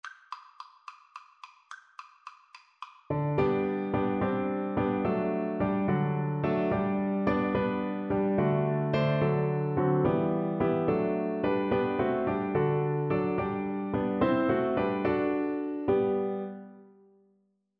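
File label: viola karaoke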